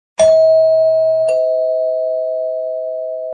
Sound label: Doorbell